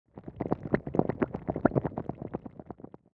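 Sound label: explosion acid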